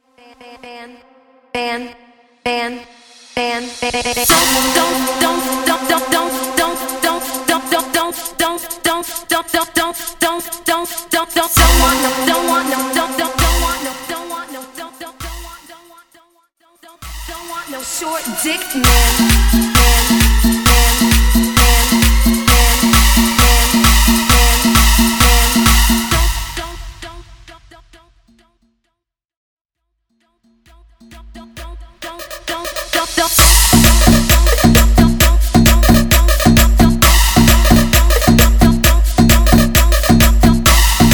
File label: Don't Don't Remix Agressive BPM 130